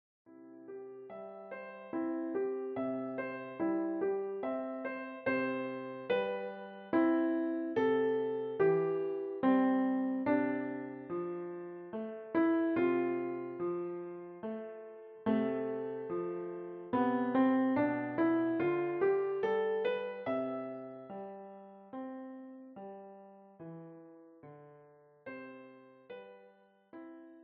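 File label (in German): Der Schwan, Klavier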